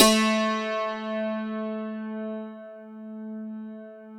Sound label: A3, DULCIMER; SoundBytes; Early Patches